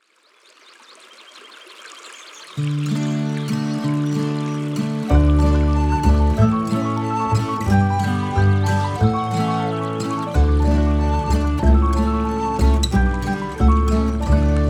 Version instrumentale; Studio les Trois Becs

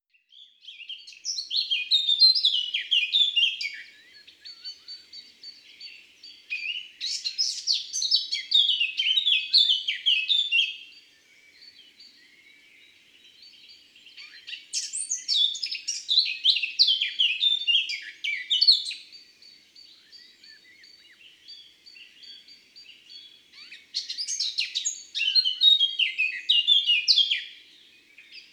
Fauvette a tete noire Chants d'oiseaux dans la campagne

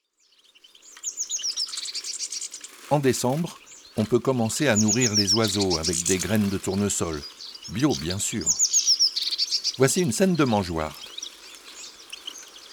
Mangeoire, chants d'oiseaux

